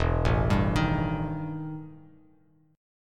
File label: E Minor Major 7th Double Flat 5th E Bass Standard; arpeggiated; JGuitar